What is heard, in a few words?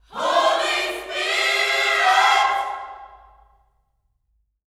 H SPIRIT, Gospel